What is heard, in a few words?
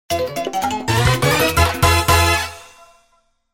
victory music